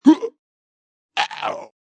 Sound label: Vocal Deep Huh Ow 110, Funk vocal loop sample; 110bpm